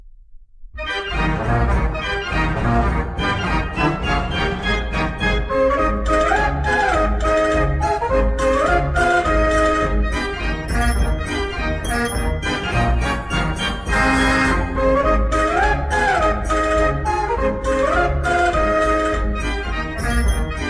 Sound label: Mechanical Organ; Merry Go Wrong samples In The Toyshop by Mechanical Organ; Chart Runners is a 1998 vinyl album